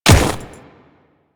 Ice Step, Footsteps; Warframe; Grineer Royal Guard

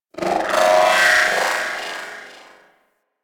Corpus Ship Robotic Random Calls; Warframe; Corpus Ship Remaster

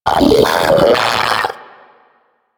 Arachnoid Vocal Patrol; Warframe; Corpus; Arachnoid Hunger

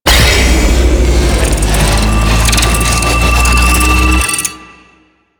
Archer Charged Shot Ability Power Up; Warframe; Duviri; Duviri Dax; Abilities

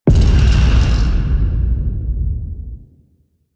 Gem Hit; Warframe; Quests; The New War; Lotus Gem Part One

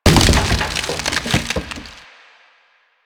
Wood Bench Destroy; Ambience; Props; Warframe; Nineteen Ninety Nine

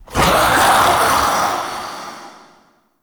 Mockery Male Vocal Attack Heavy; Characters; Enemy; Vocals; Mockeries

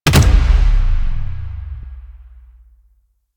Gunbrella Alt Punch, Weapons; Warframe; Tenno; Koumei

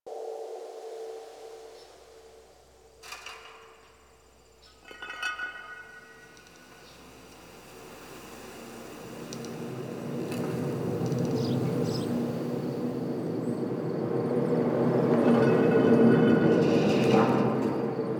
Fall Exterior Medium Bed Loop; Warframe; Nineteen Ninety Nine; Ambience

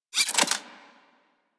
Pistol Mech B; Sybaris

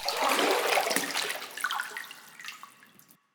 Pull Out Of Water

